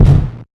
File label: footstep; Garry's Mod; indigo park; nightmare catnap